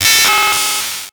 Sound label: Title Transition Data Transmission Computer